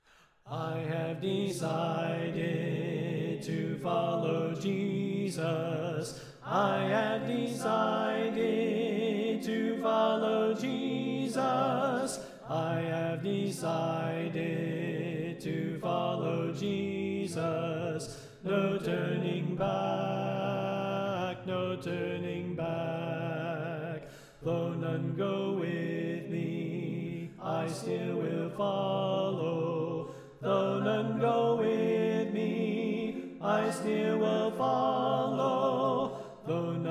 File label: Tenor Left